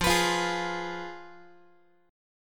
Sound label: F Diminished Guitar; Fdim strummed; JGuitar